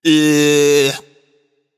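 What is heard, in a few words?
tongue, non-verbal; smite; voicepack; merlin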